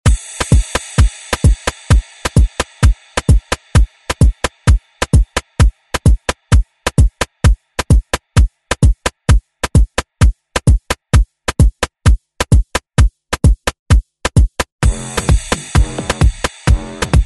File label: Re Drum; DJ Mhark